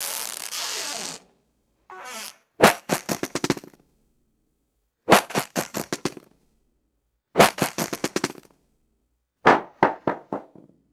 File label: masking tape roll dropping and bouncing; destruction